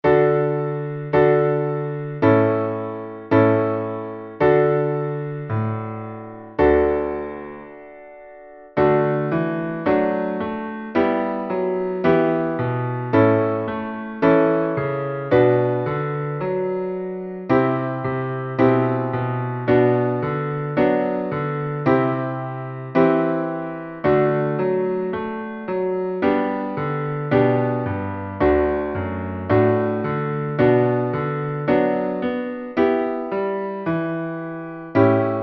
Null und Eins, langsam, Klavier

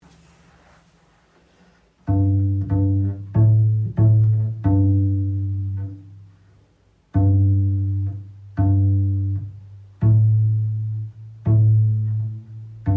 Bass pizz; gezupft

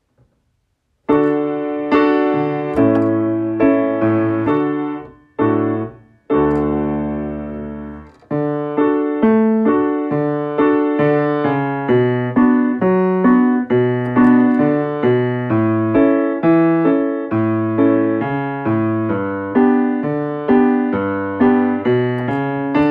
Klavier langsam

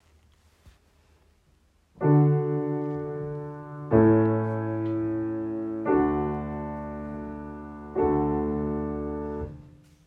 Klavier arco; langsam

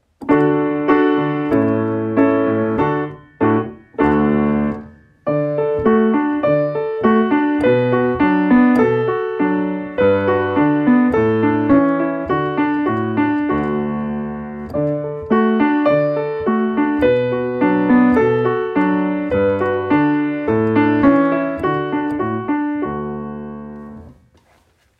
Klavier

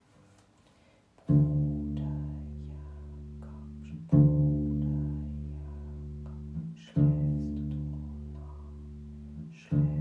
Bruder Jakob Bass

